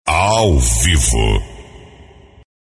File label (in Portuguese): vinheta